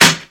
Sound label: 2000s sizzle acoustic snare sample d sharp key; snare drum samples